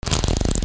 PAPER

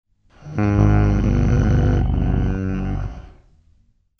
ANIMALS

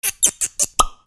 KITCHEN

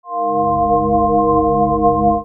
BELLS

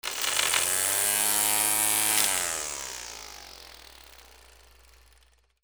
GARAGE

